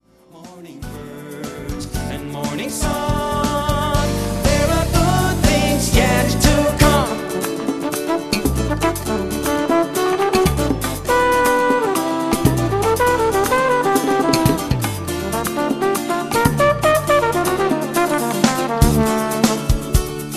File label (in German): Flh Solo